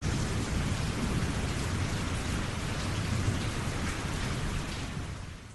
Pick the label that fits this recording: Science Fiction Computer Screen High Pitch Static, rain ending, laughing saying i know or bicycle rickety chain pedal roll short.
rain ending